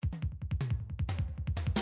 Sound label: drums